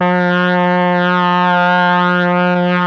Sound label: BRASS; ILIO; Propeller Island Legendary M400